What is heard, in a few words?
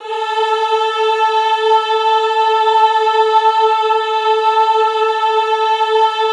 EHH A3, Classical Choir; Best Service; Extended Classical Choir; EHH MIX